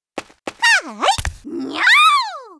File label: Special Attack